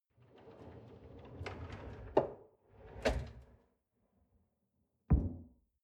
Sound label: folding door close long